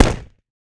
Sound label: pop; TotalImmersionRacing; XBOX